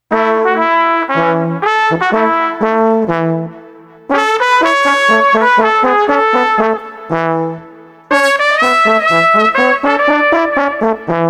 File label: Street Brass NOLA Combo no Drums 120